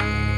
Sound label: Synth